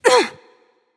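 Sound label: grunt hit, female; smite; voicepack; hera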